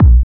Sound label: Bassdrums; Vengeance Samples